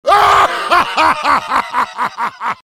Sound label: yell; heavy